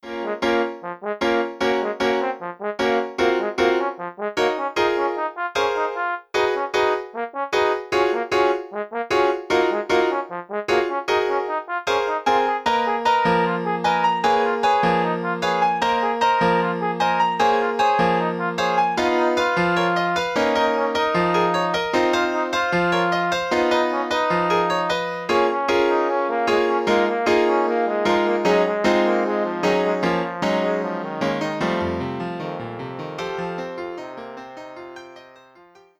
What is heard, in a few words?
Allegretto Trombone and Piano